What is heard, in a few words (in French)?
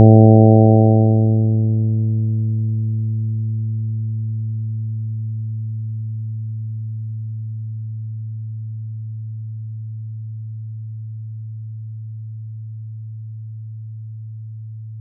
harmoniques